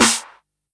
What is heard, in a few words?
normal hit normal